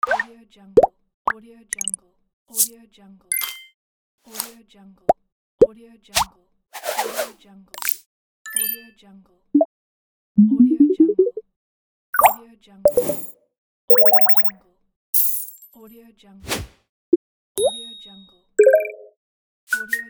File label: infographic sound effects